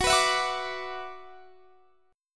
F sharp 7th Suspended 4th Sharp 5th, Guitar, Standard; F#7sus4#5 strummed